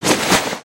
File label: backpack cloth; aronwk collection; ndaudio